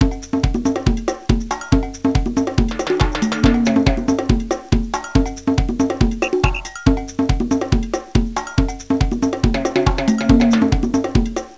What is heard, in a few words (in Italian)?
suonerie musica ritmica